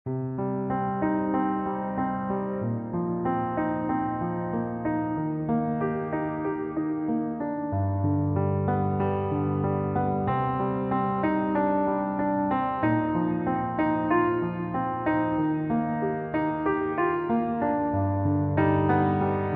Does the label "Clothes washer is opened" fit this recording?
No